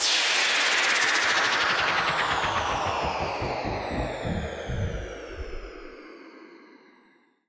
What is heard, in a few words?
Modulated White Noise Down; House; FX